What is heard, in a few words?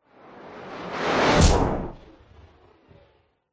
science fiction projectile incoming hit thud rebound; zapsplat